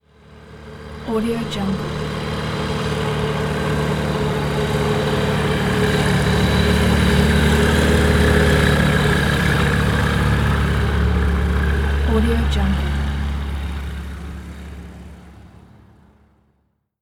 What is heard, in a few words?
propeller plane pass by on runway; soundjay